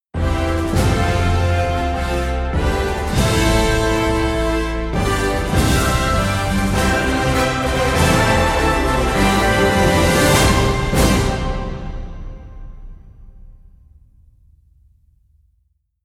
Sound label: no choir